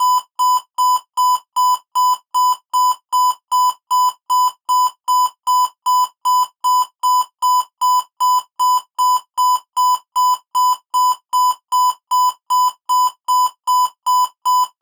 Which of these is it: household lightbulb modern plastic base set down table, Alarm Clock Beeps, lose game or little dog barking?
Alarm Clock Beeps